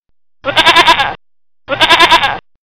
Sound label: notification